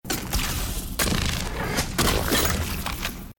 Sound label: reload; EMXArms; emx pmg90